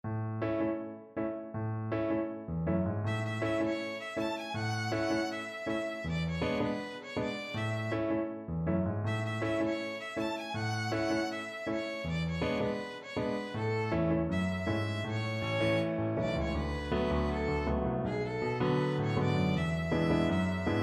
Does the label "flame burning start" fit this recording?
No